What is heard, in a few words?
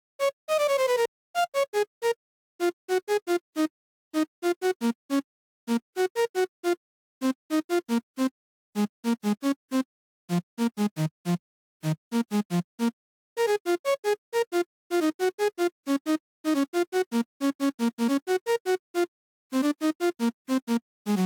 Melody; chippy